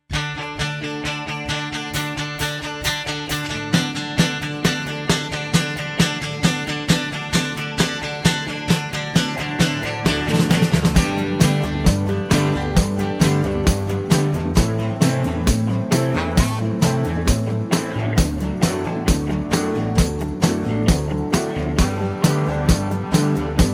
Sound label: G No Backing Vocals, backing tracks